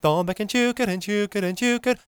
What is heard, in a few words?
GAELIC, World Voices; ILIO; Vocal Planet